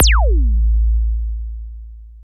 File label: FX, attacks